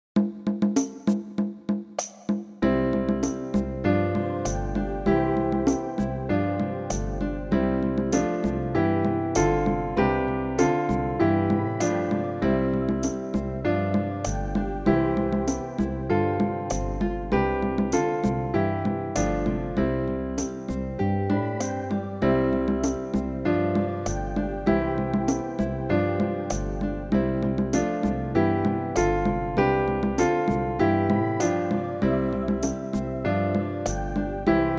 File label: Contrary Cakewalk Slow, piano